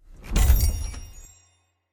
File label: buy show; silverback